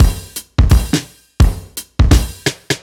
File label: Kit Heavy Swing, 85bpm; off the grid